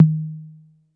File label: B2 Analog Tom, Dance Kit; Yamaha PSR-E323; drum machine